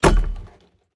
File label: clap; argus; smite; hera